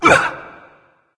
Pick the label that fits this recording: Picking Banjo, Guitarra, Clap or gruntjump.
gruntjump